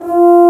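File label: Bassoon F2; Digital Sound Factory Virtuoso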